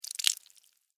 Blood Spill Drip, Horror, Blood, Spill, Drip; Blood and Guts; BlastWave

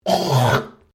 MALE HACK; Male Vocal sounds; Sick; Human

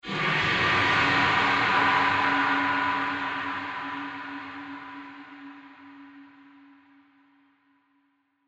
Horror Swirl Dark; Scary Tone; Blastwave

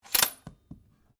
RIFLE REMMINGTON700 7.62MM BOLT ACTION OPEN; A selection of domesttic firearms; Rifles; Guns

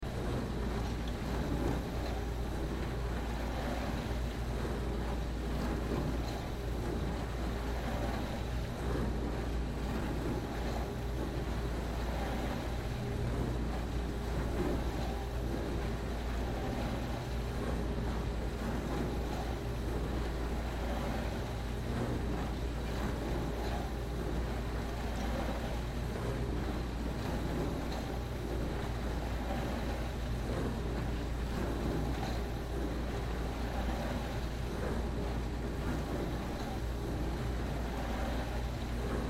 Dishwasher Wash, Dishwasher Wash Cycle Run Loop; Whirlpool Appliance; Household; Blastwave

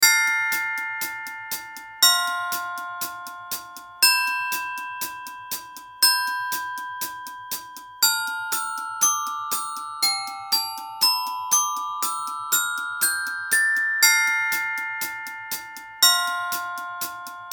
BEAT SLOW GLOC, Beat Slow Glockenspiel High Hat; Miscellaneous Loops

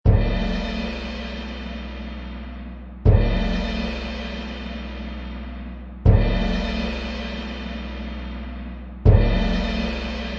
orch drums, Orchestral drum loop 160 bpm.; Drum Loops; Music Production Loops

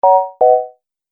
Multimedia Completed, beep; Beep Sound Effects

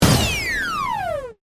Burst Descend, Transition; Production Element, Imaging Element, Accent; BlastWave

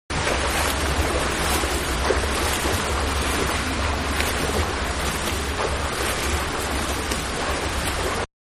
Swimming Indoors-Strokes-Fast; Diving; Miscellaneous Sports (Sound Effects:Sports; Manhattan Production Music

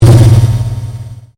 Convolution Pulse

